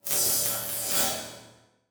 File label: Transition Electrical Surge